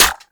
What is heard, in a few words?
Heavy Pistol Mech Alt C; Warframe; Weapons; Zariman Heavy Pistol